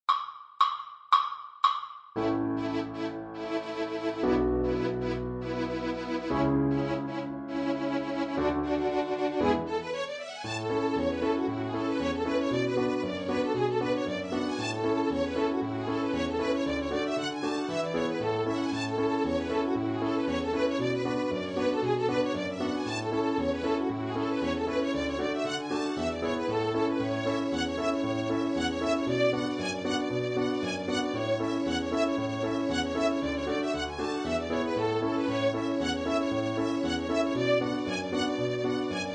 Masons Apron high

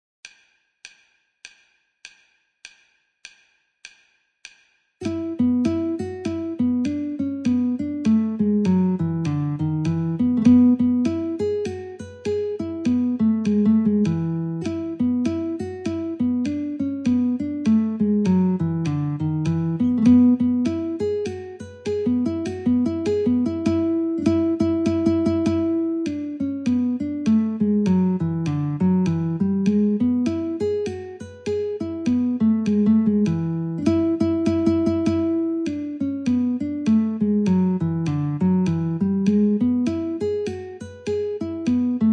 Reel Guitar metronome 50

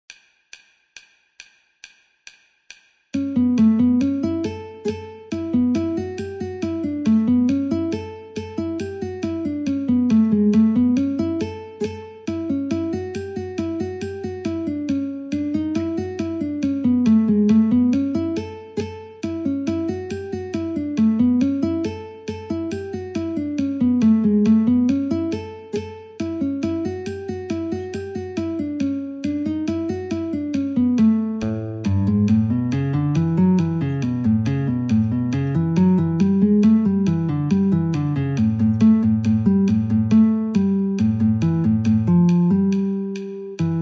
Dusty Miller Guitar in A metronome 69

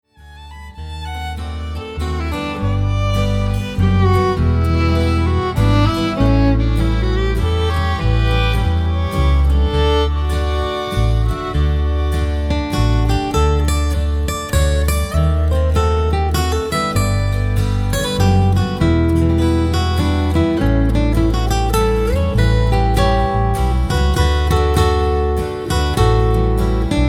Whistlers Waltz

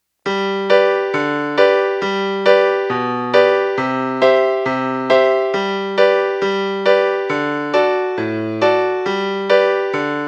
accomp

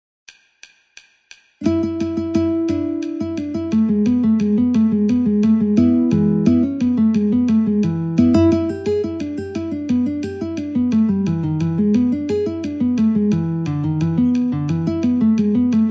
Guitar metronome 88

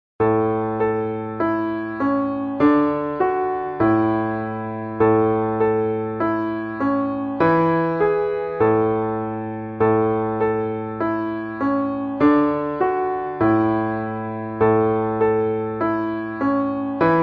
Cripple Creek alt A Melody

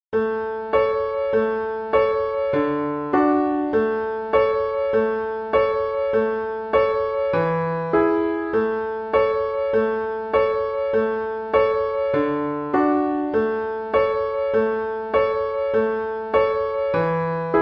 Cripple Creek A Accomp

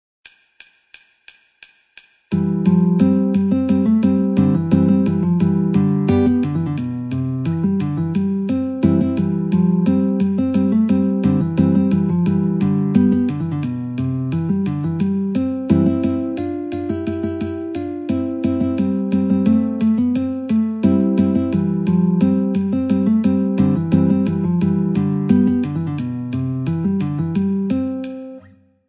Wild Flowers Guitar, metronome 88

